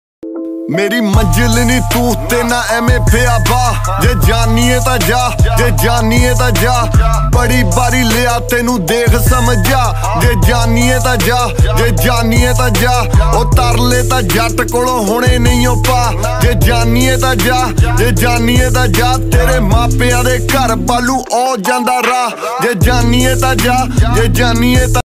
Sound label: Punjabi Beats Ringtone